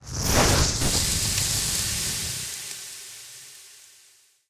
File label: tools booster ignition; teardown ps5 windows xbox series xs gamerip 2022